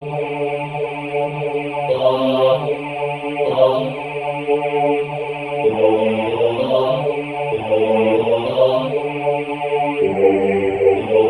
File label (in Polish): Bas solo; Chrystus Zmartwychwstan jest